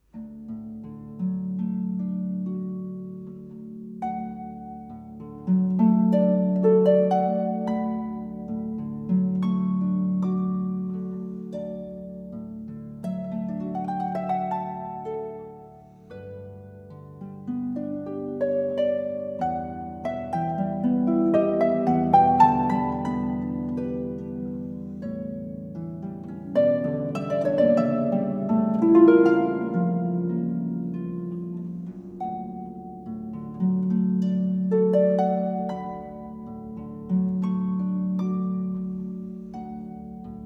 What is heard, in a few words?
Meditation from Thais; Massenet